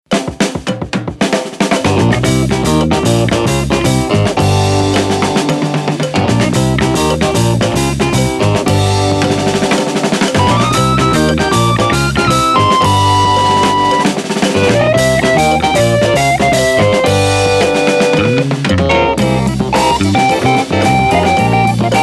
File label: Phrase Overdrive